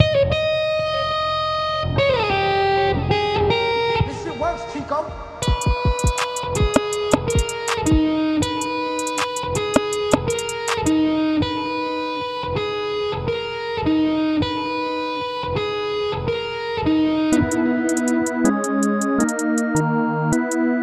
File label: Type Beat Guita